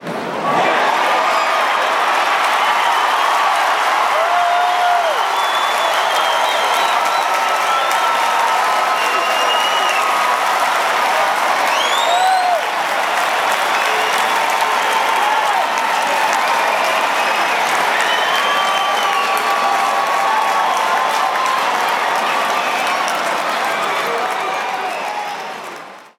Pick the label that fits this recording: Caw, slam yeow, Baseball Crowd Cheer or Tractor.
Baseball Crowd Cheer